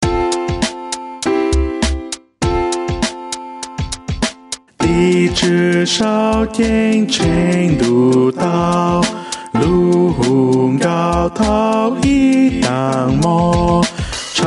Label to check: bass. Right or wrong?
Right